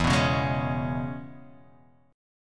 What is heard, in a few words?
E 6th, down